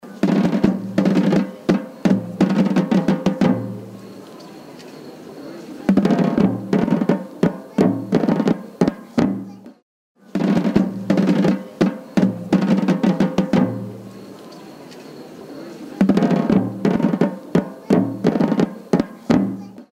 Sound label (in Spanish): toque tambor; macarena